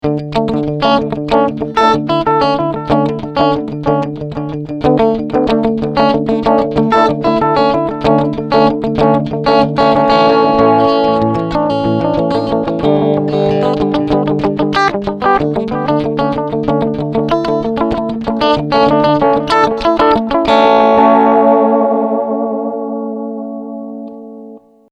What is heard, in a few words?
Preset Tape